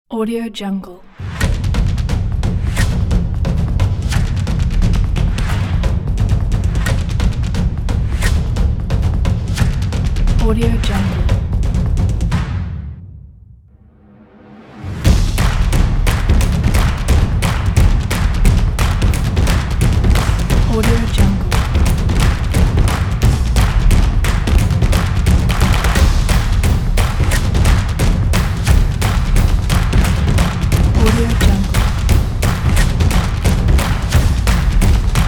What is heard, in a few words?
stomps